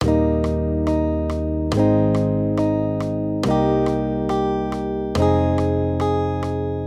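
Guitar, Accompaniment, Major Triads, Eb-Ab, 140 BPM; maj5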